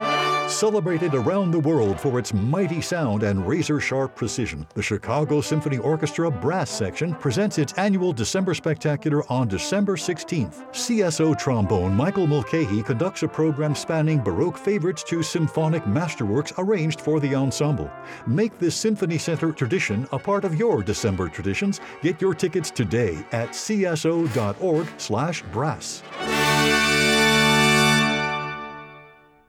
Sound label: Brass; Chicago Symphony Orchestra